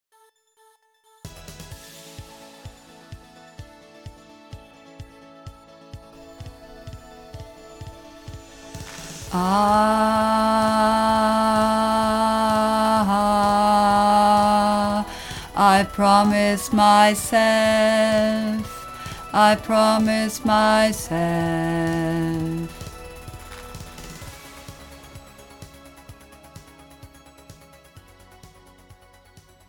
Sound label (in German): Bass Nur Bridge Vereinfacht